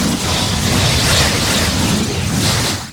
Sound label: Tornado target